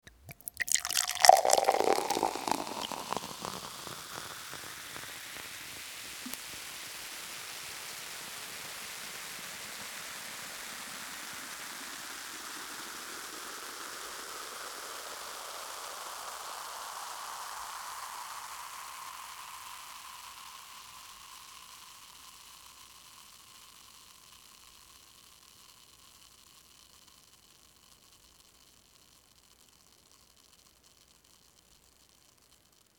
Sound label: Bottle Pour